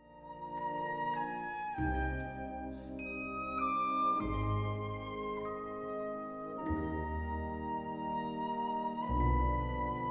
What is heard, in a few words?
Death March